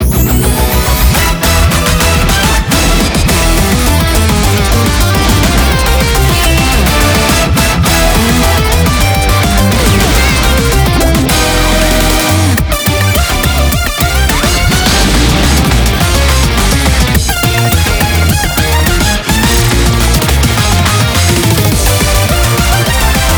BGM Reach

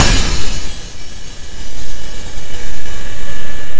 Cymbal, E4; Guitar Rock Tour

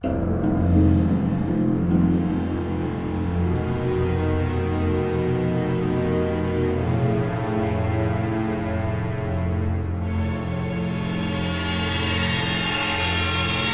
Alarm; Vampire Rain; Xbox 360

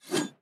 Swing Spear Short; Swing Spear Short First

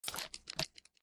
Weed Killer Empty; lethal company

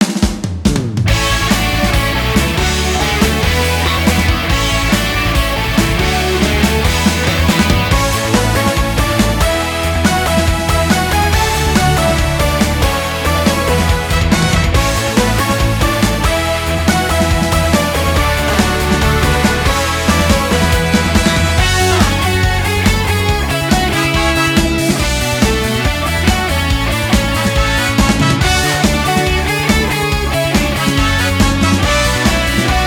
Music event; Power Pro Kun Pocket R

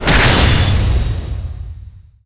Enemy Iceberg Active Both Arms Press

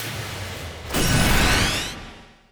Powerball Hit

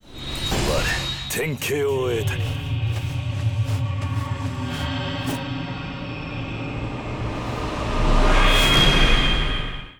success; Ryu ga Gotoku Kenzan; PS3

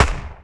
Gun; Carnival King Arcade; wii gamerip 2002

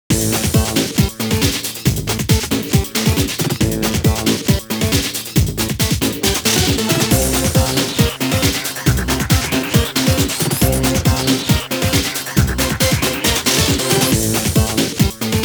Continue; Tatsunoko vs. Capcom Ultimate All-Stars

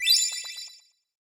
Box Extend

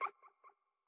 Bat Hurt

